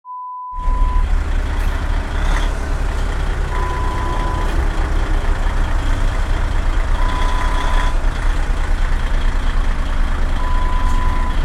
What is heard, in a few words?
big truck sound